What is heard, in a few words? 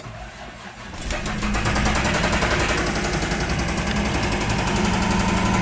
rod knock